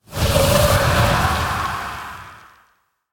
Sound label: Sandstorm Elemental Death